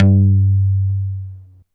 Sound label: TAKKER G1, TAKK-BASS; Music Store